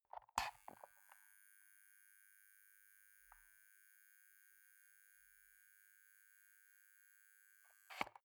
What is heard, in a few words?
foot switch dimmer slide up buzz down